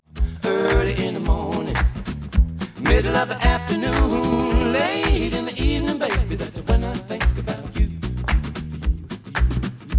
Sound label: noised; 3bit